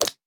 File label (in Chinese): 青蛙长跳声